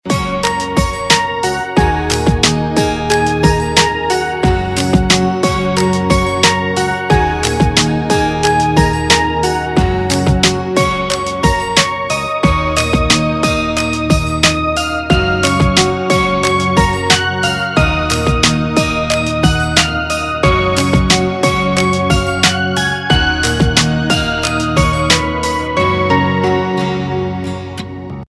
Instrumental Ringtone; Bollywood Hits (2014)

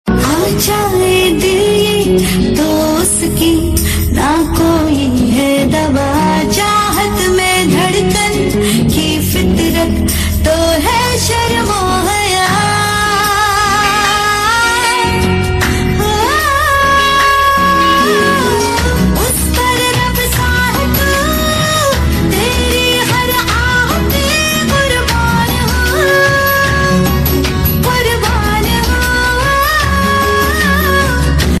Female Sad; Zee TV; Yeh Vaada Raha; TV Serial Tone

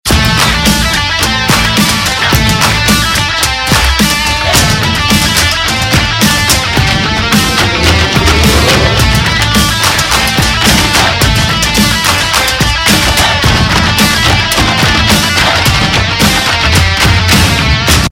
Instrumental, Ringtone; Dhoom 3; Bollywood

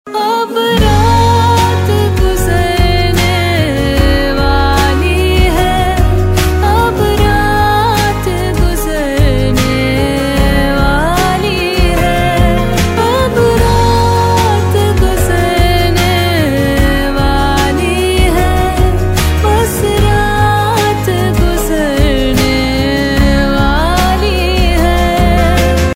Female Version Ringtone; Bollywood; Dobaara - See Your Evil

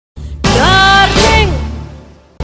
Sms Tone; Bollywood; 7 Khoon Maaf (2011)

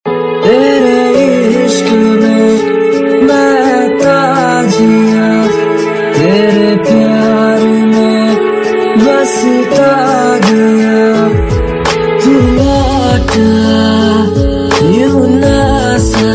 Love Sad Tone Ringtone; Indian Pop

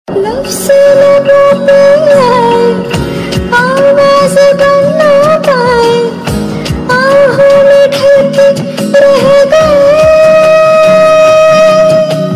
Duet Tune

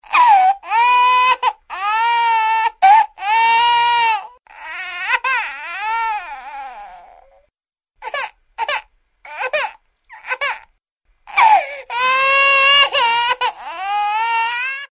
Baby crying sound effect

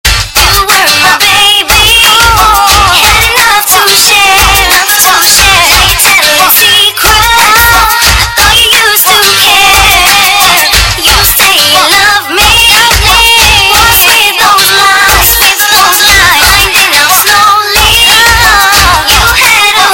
Rhythm Loud Loudest Ringtone

